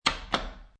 Playing Cards Tap Deck Of Cards On Wood Table

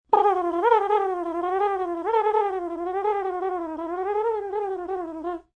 Trumpet Fast, Vocal instruments, trumpet

